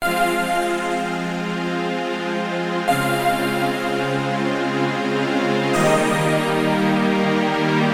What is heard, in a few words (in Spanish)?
SINTETIZADORES; multitracks